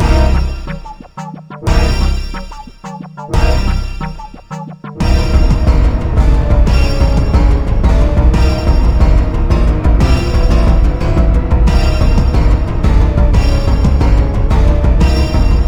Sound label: chase; tf; slender; therium